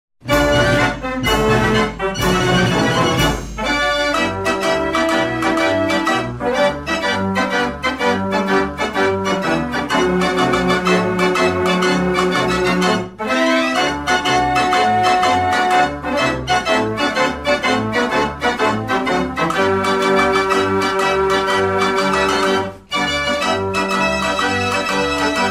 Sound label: 105 Key Fairground Organ; Valencia Gavioli Gaudin